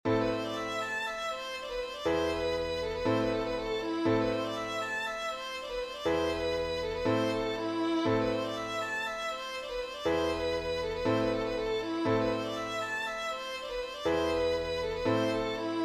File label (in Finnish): polkka